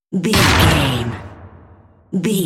cinematic stab hit trailer

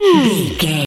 comedy siren whistle fun down

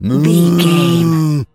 cow moo

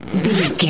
foley zip zipper long

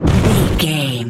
fireball blast projectile spell

